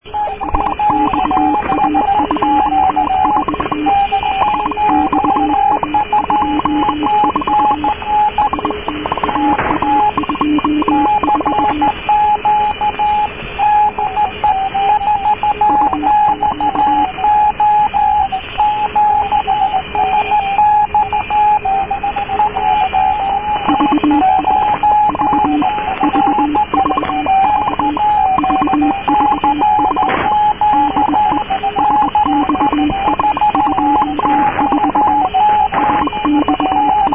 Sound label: haifa radio cw; haifa radio '4xo' cw (qrm 6yi sxa) (1983)